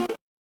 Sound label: retro game text blip